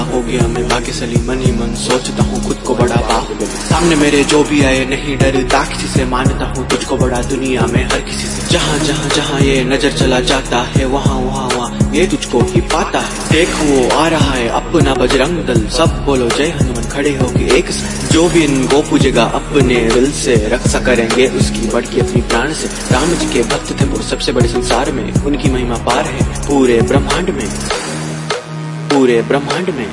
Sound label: Rap version Ringtone; Hanuman Ji